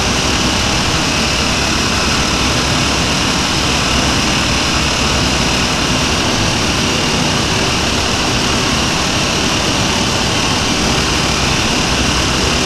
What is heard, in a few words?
Prop Rack Small Move Dist, Warehouse; Titanfall 2; Boomtown